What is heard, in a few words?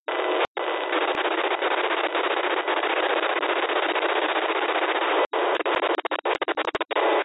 BMW Key-Code Signal